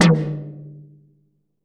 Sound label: RESO TOM, DRUM KIT; Drum Kit1